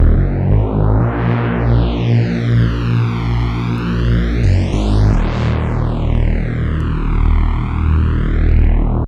casio xw-g1 wavenation

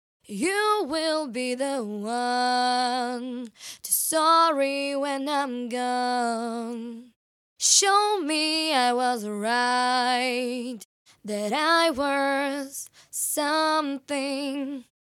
VOCAL DRY

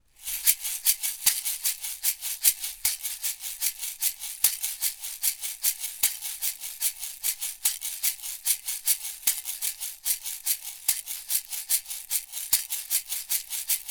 Warm Audio WA-47 test shaker

